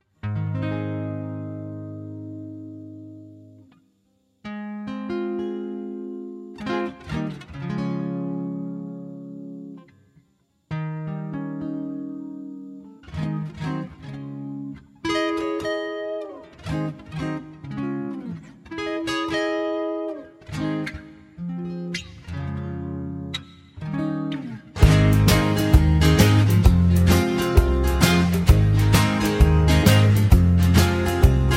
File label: (Ab) No Backing Vocals